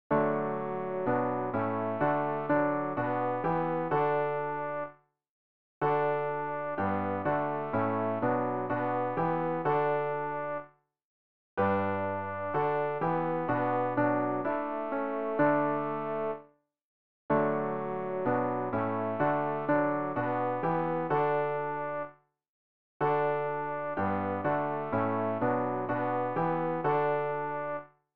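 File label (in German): tenor, Übehilfen; RG 027: O Höchster, deine Gütigkeit; Ref. Gesangbuch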